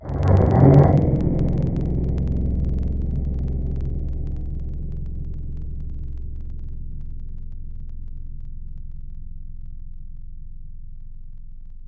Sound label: Koto Scrape; Synthetic's Serum Collection; Echo Sound Works Sphere